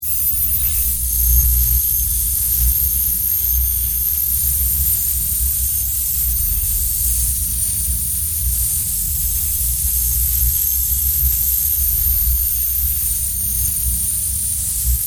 Electric Magnetic, Scifi, Electric, Magnetic, Field, Discharge, Complex, Science, Fiction